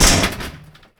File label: land metal grate, footsteps; player